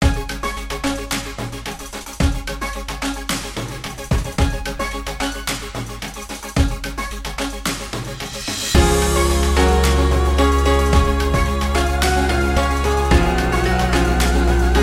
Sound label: ending